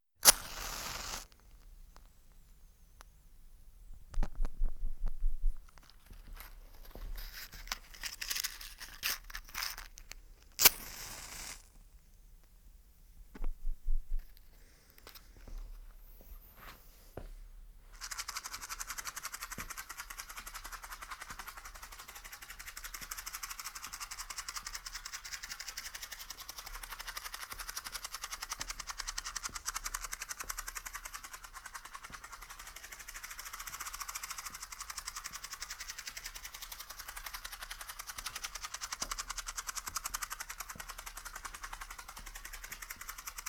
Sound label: Matchbox, olofonia; L'olofonia è una tecnica di registrazione e riproduzione audio eseguita tramite uno specia